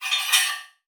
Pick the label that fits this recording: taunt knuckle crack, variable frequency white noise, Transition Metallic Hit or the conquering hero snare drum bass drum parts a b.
Transition Metallic Hit